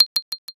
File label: hyoshigi